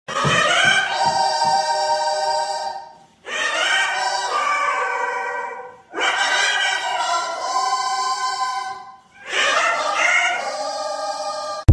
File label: sung acapella